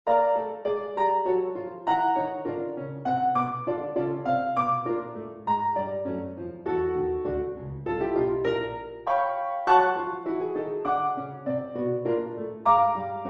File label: Variation Two Duet version